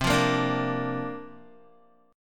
C 9th Suspended 4th C down Guitar 4F, 4A, 5C, 5G, 5C, 6E; C9sus4 strummed